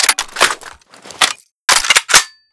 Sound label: mg34 reload; emplacement